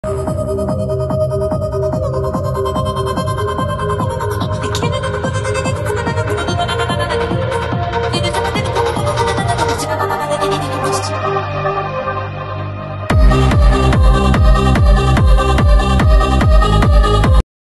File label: techno remix